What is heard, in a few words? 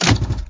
Mechanicals smaller